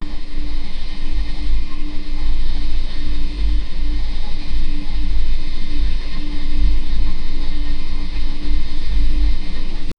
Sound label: bubbling chemical liquid